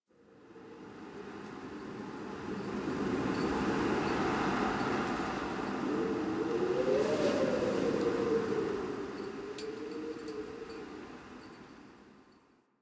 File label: Exterior Winter Wind One Off